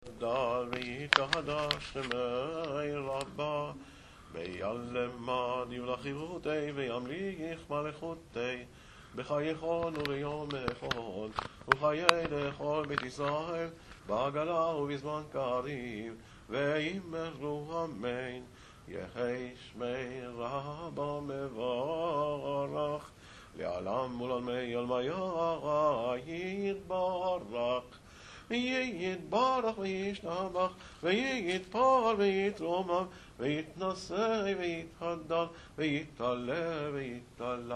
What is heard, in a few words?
chatsi kaddish